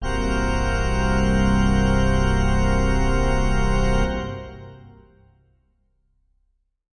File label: Heavy Pipes C, heavy pipe organ; SYNTH KEYS